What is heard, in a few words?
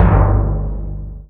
timpani; osu